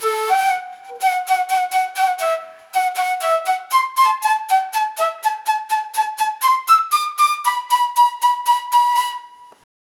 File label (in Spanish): flauta sola un ritmo, wind instrument